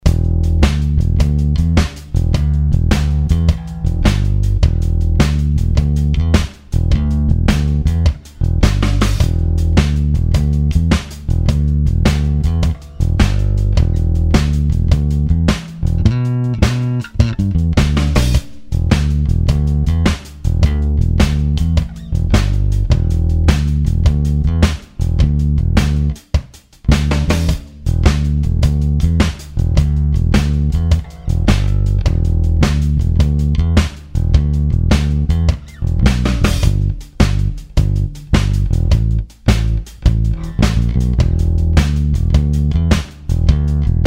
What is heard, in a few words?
Bass Groove